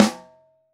Top, Snare

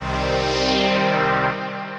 Aroura Pad, Synth Pad; Ableton; Factory Packs; Synth Essentials

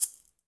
Maracas; Latin Percussion; Drums; Drum Hits; Shaker; Ableton; Factory Packs

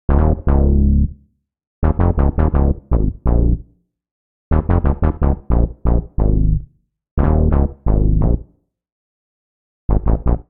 Bb Minor 89 bpm, Bassline; MIDI Clips; Tonal; Freak-o-saur; Ableton; Factory Packs; Chop and Swing

